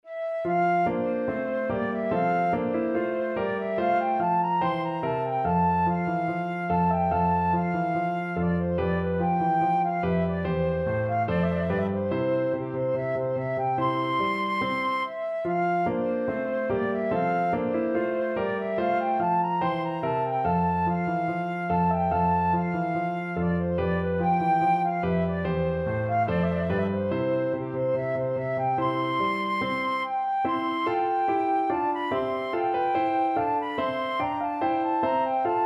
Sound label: march in d, flute